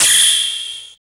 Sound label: FX Dance